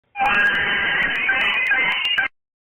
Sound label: baby laugh meme